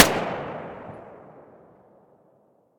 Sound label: silencer g36 mono distant, weapons